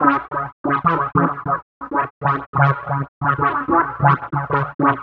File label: Wah Synth; Piano Stomp